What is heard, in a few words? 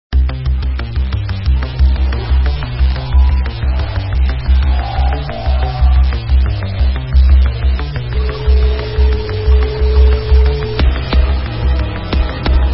Anger